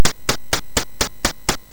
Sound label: Fast Sfx, MOS6581R3; hvsc